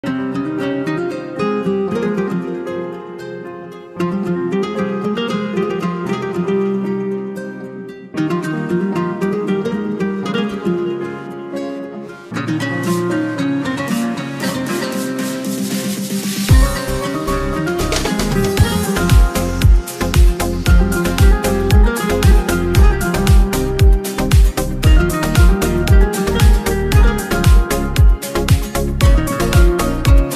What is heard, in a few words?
gitara